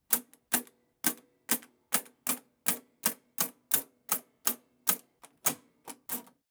Pressing Buttons, Victor 1920's Adding Machine; Kevin Durr

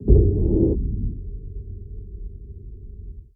source composite impact, Sci-fi Cinematic; Blik Blinks; SIGNUM; SFX Pack

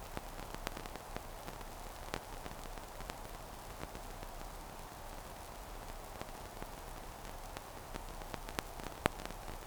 Vinyl Noise; Certified Audio; Ambient Soundscapes

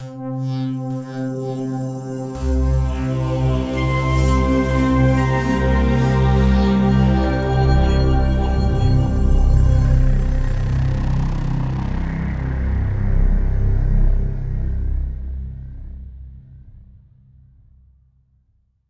Signature Sound; FXHome; Signature Tracks

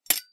sword; Kenney's Sound Pack

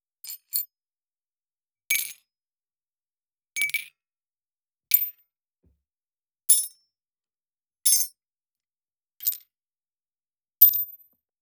Metal Sweeteners - Fall - various objects; aXLsound

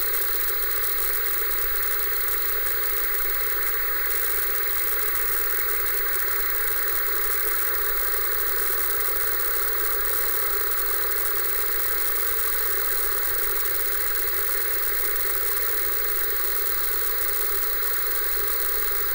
Analog TV Noise Modulation Crackle; The Soundcatcher

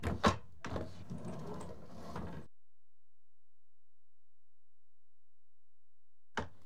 Window Open Leather Strap Type; BBC Sound Effects Library; Age Of Steam

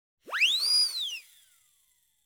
Siren Short; BBC Sound Effects Library

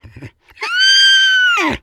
Pony Whinny Heavy, Shetland Pony; Jack Powell; Shetland Pony Vox

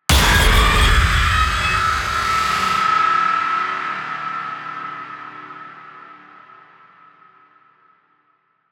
Horror Impacts - Robotic Scream; SoundMorph; Bloody Nightmare

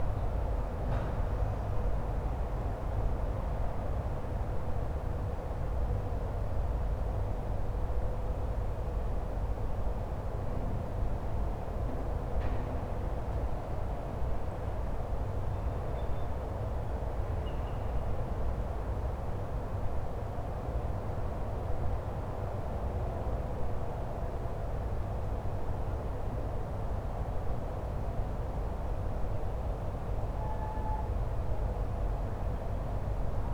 outdoor urban courtyard neutral ambience; Giorgio Riolo; SILENTSCAPES

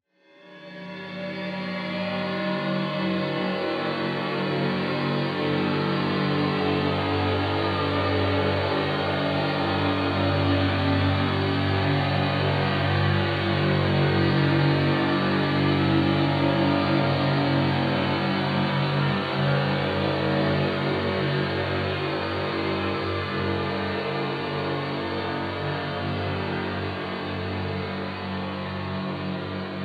Atmosphere; 99Sounds; InterSpace

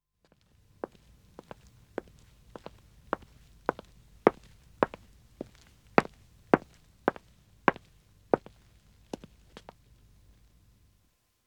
Wood (Dead Acc) Walking man a; Footsteps; BBC Sound Effects Library

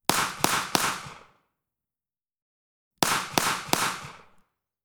SIG P229 9mm 1m left on ground clean Triple shots; DPA4062

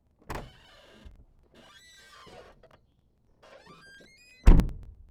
Trunk, Car Doors; FXHome

